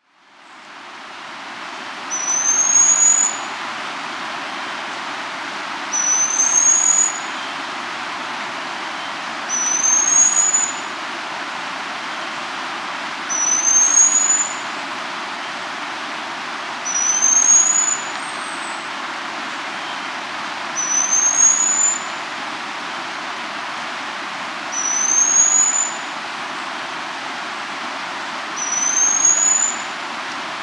Black-Streaked Puffbird (Malacoptila Fulvogularis), Andean Birds; S. America